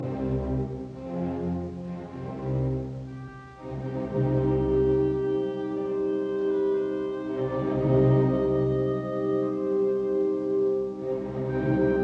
Symphony in E flat major Marcia funebre Adagio assai; Beethoven; Op 55; Eroica